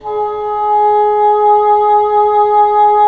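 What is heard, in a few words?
wind bassoon; LA Composer vol. 3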